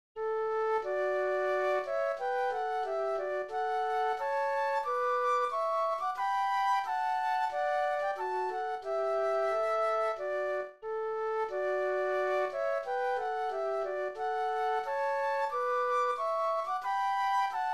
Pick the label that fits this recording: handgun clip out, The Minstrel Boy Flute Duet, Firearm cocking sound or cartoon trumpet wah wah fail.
The Minstrel Boy Flute Duet